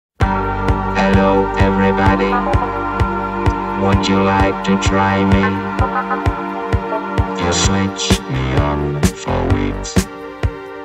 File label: Disco Computer Music